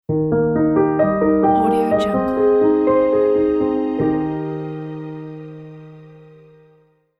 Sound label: inspiring piano logo